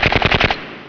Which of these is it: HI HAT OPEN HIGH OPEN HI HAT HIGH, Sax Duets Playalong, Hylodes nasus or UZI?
UZI